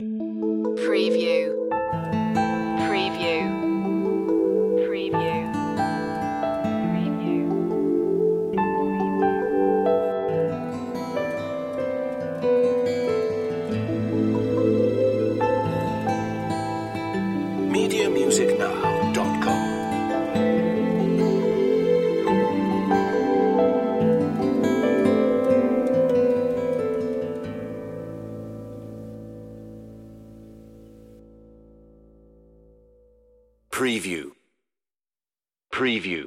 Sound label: gentle